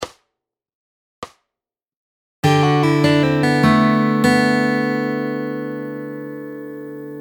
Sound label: Fingerpicking Lick; Fingerpicking Fun